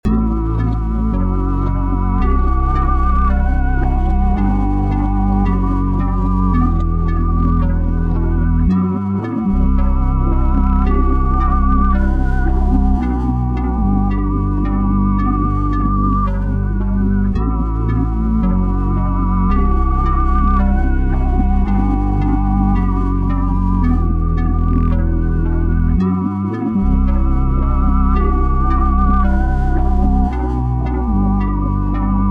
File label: BPM 111